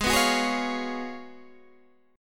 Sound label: Ab Minor 13th, Ab, Guitar, Standard